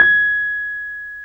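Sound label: piano Wurly; Keys of the 60s and 70s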